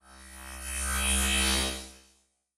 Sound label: Laser Charge Up; SoundsCrate